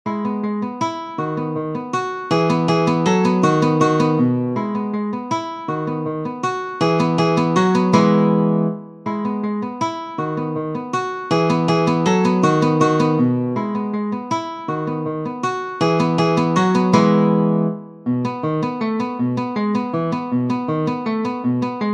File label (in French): Walze, guitare